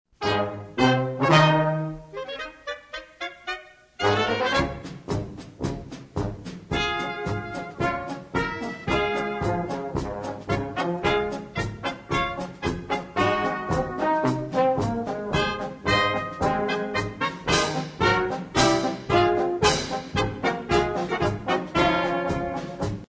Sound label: TRUMPET POLKA